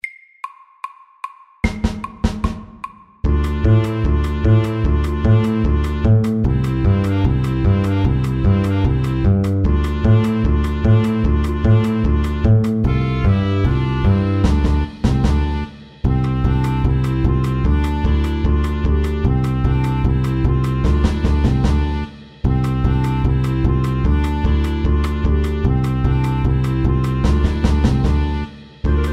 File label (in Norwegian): Balkan stomp FORT